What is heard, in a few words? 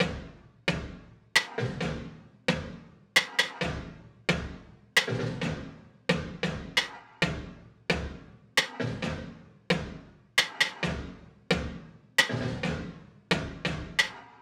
Drum Loops; Crowdsourced Sample Pack; Drum Kit